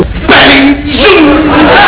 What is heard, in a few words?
bang